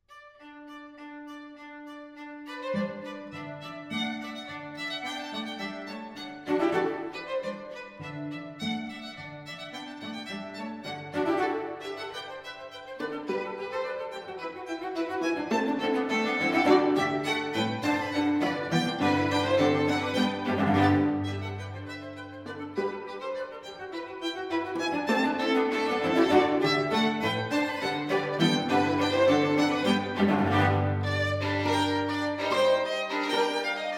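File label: string trio; rontgen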